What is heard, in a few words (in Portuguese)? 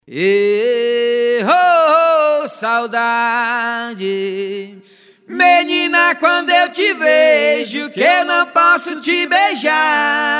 aboio